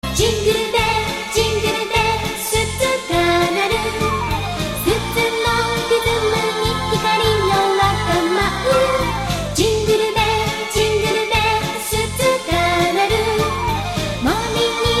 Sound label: Jingle Bells